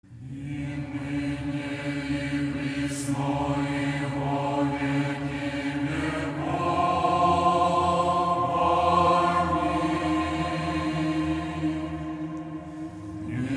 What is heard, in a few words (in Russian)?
Фита. Возвашные стихеры малой вечерни на Воздвижение Креста; Слава И ныне глас 6